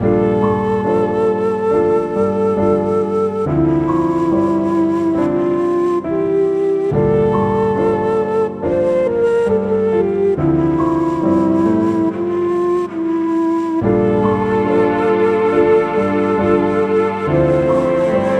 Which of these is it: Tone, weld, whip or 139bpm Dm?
139bpm Dm